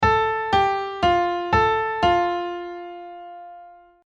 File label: Minor Going Down; minor key going down piano quiz